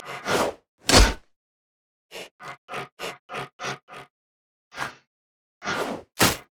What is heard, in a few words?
emote laugh; nautilus